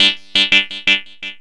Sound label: SYN LP, 170 BPM; Ueberschall; Drum & Bass Flash Trackz